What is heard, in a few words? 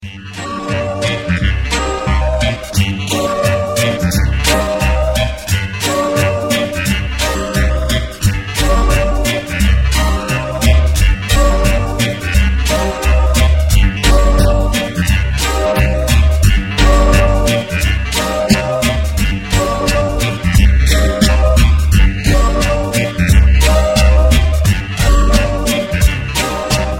western music; Country